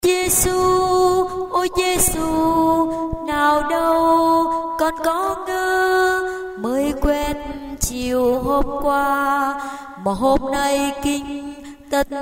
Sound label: alto